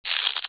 bow stretch short

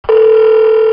phone ring

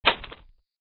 rope snap

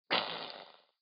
dracula shout

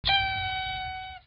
Party horn

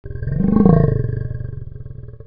deep growl

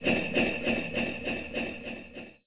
sleigh

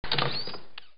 open door

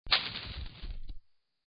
branch

